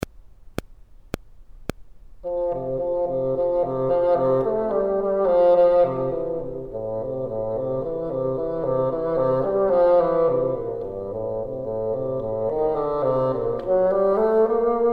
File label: bottom, bassoon